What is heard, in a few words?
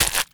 octo steps; Garry's Mod; tf2